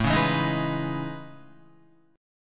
A Major Sharp 11th, Guitar; strummed

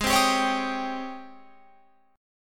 G sharp Major 11th G sharp, Guitar Open F; JGuitar

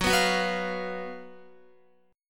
Gb Major 7th Suspended 4th Gb Guitar; GbM7sus4; strummed; x,9,9,11,11,12; JGuitar

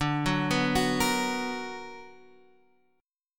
B Minor Major 7th

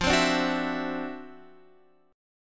D 9th F down Guitar Standard; strummed; JGuitar

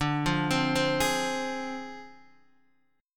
D Minor 7th Flat 5th Guitar DADGAD

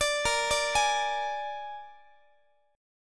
A sharp 7th A sharp, Guitar; A#7; arpeggiated; x,x,17,20,18,19

